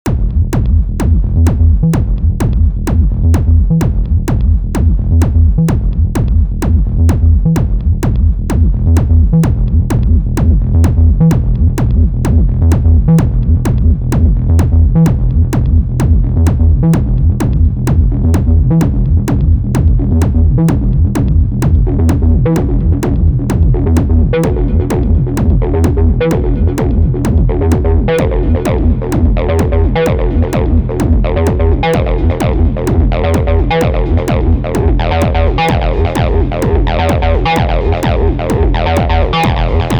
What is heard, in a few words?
korg wavestate 303, nasty wavestate 303; TB: Korg Wavestate